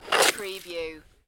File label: Snow and Ice, Ice skate slide with ice particles